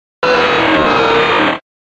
rayquaza cries; pokemon

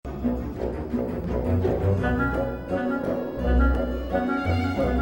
ghost theme music; sims 3

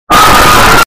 jumpscare sound; five nights at hedgedog 1

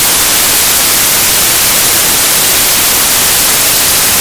static

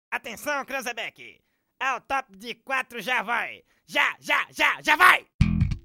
ringtone

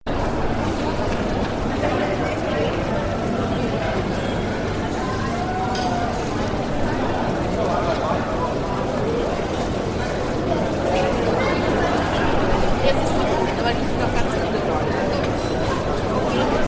shopping mall ambiance; soundbible

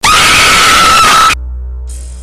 waaaiiil

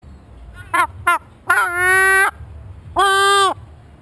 seagull; stole gopro

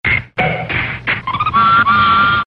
notification sound

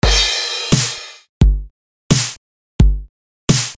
drum loop

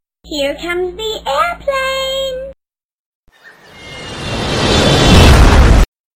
plane

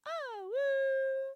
awoo

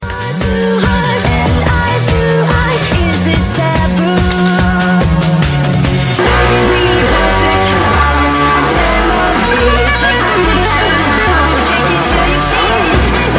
Guitar

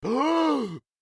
surprised

